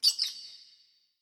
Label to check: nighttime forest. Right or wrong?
Wrong